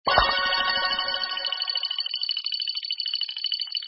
big win; Tongits

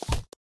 spin

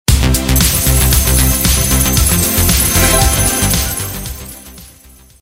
mega win

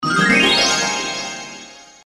show money

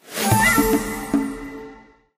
win med

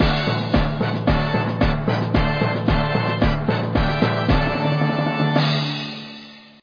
WIN

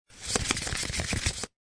deal Card; pusoy

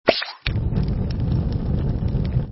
eat card; Tongits

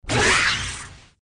die

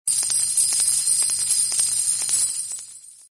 spray gold coins

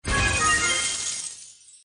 collect coins

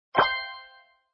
box fish

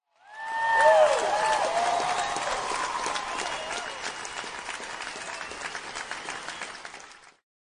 huanhu

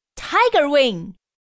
Win

